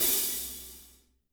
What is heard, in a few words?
16 HAT, DRY KIT; Spectrasonics; Burning Grooves CD2; DRY KIT 4